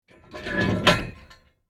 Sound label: Tank hatch opening